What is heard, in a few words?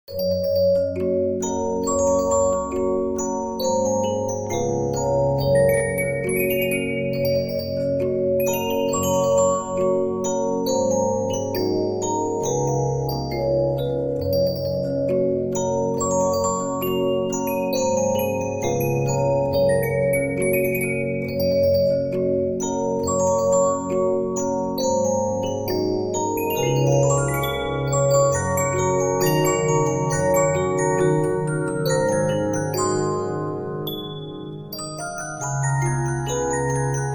Regina, 15inch disc musicbox